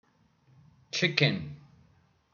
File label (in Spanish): gallina